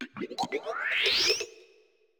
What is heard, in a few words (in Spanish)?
Grito de Glimmora; Gritos de Pokémon de la novena generación